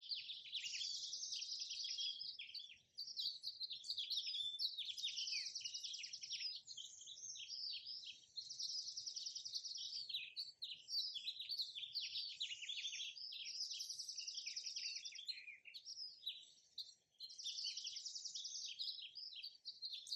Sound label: birds chirping